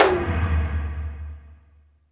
MAD ROTO, TOMS; Back In Time Records; Big Bang

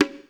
PC, Casio CTK-5000

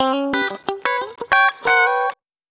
COUNTRY

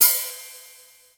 Misc Cymbal; Mo' Phatt; Digital Sound Factory Mo' Phatt

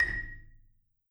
A#5, Marimba; hard F; Sampleheads - Dave Samuels Marimba & Vibes

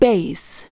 vox, Techno Trance; Ueberschall

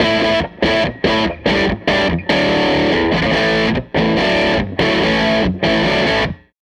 Les Guitar Loop

